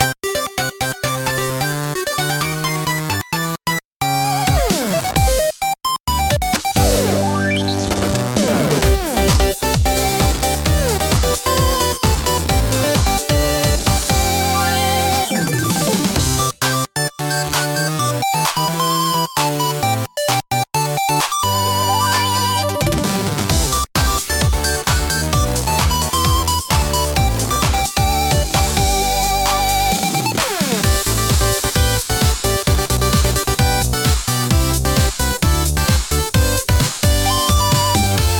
retro game chip tune

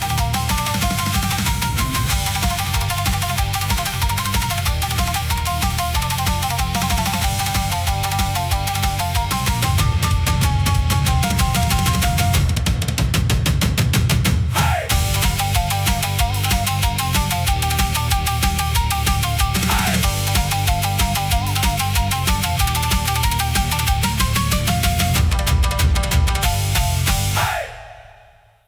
Kage Run, intense japanese rock

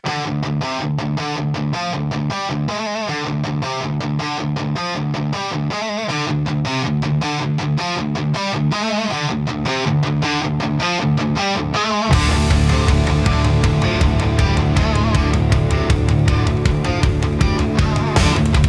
hard rock for powerful

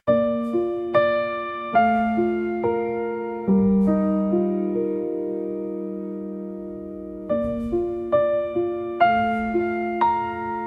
fantasy music nature forest; ghibli style; wind healing nostalgia

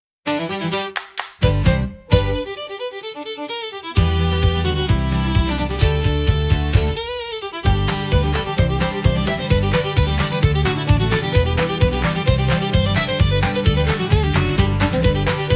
irish pub rock

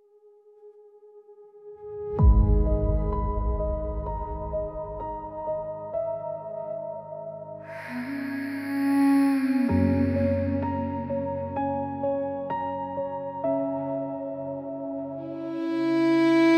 spiritual spooky soundscapes

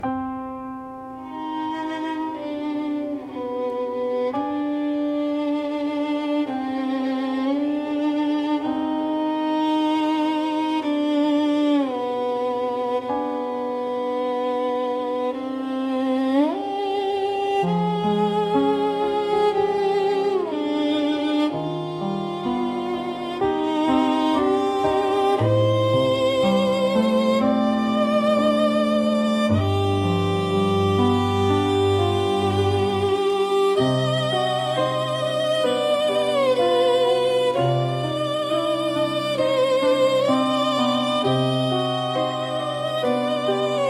spiritual spooky soundscapes

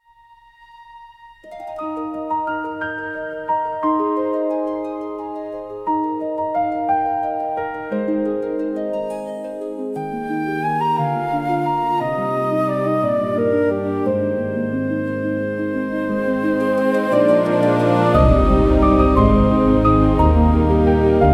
fantasy music nature forest

